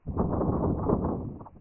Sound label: volcano